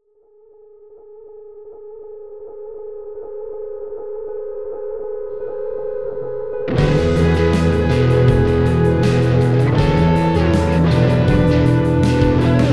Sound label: tiny major metal